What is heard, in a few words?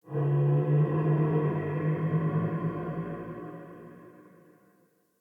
Tree Gnarled Oak Moan; Trees